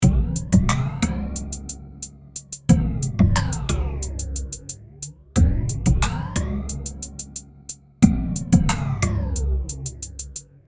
E Drum weird loop

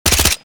MK2 assault rifle suppressed fire; war; gfxsounds

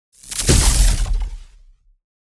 Powerful energy blast shockwave

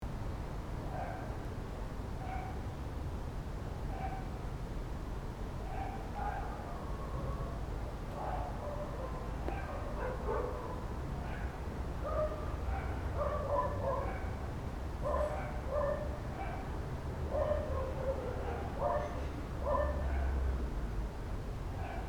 Suburban playground night time dogs barking

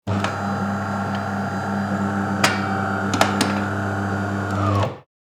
Printer starting up whirring noise; scanner and printer

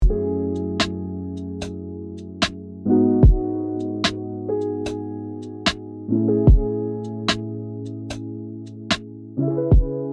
Lifestyle chillhop velvet nights

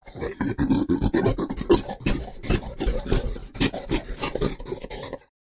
Gorilla breathing and grunting

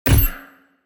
Fight combat game menu ui confirm

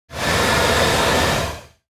Lizard creature pain hurt

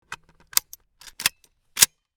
Bolt rifle reload; assault rifle

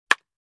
Baseball hit wooden bat

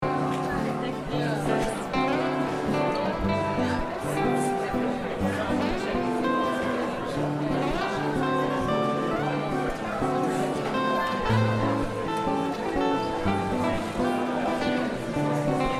Pub ambience medium mixed crowd chatter

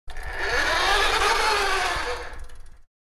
Toy car releasing after wind up clattering

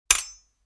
Katanas clanking together

